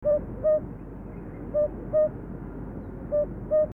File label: cuculus gularis male, birds calls; cuculidae